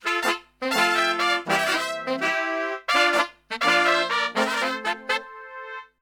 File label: MEX F, Brass, MEXICO LICK; Best Service; Brass Super Section